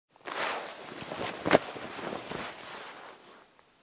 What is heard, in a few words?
other equip, clothing system